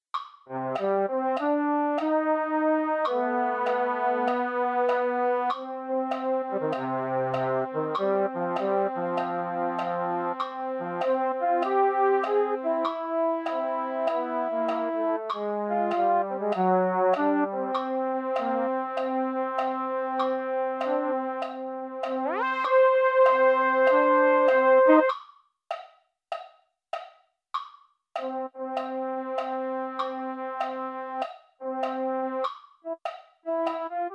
baritone, MIDI; mini corps

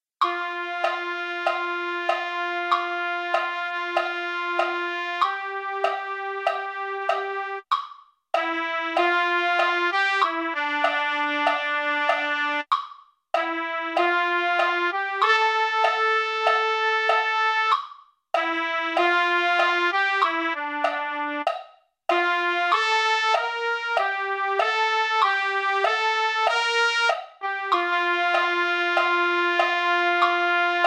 trumpet, MIDI

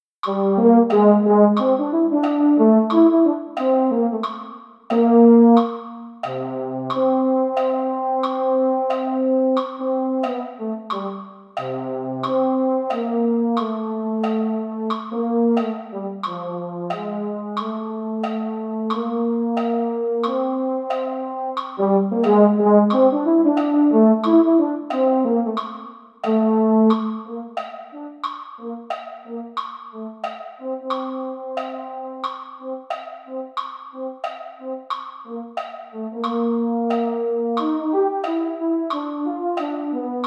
baritone; holiday brass

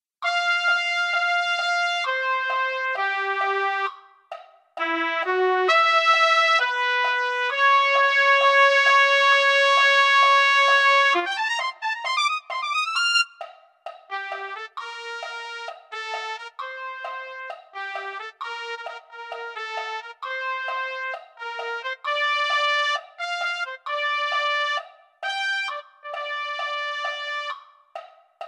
trumpet; concert corps

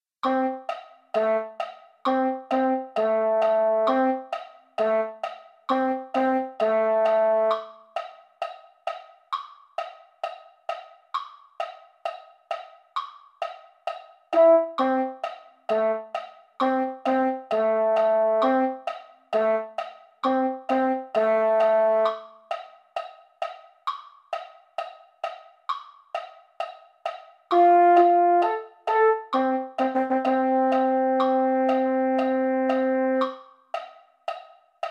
christmas classics medley part 1 - mellophone; holiday brass

